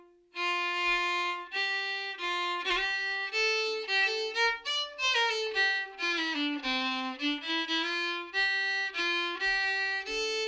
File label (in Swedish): polska; efter Olof Andersson